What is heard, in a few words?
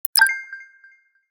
message